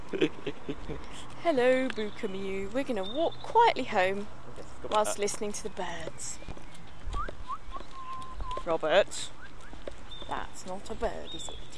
lovely late evening walk home from the pub, lots of birdsong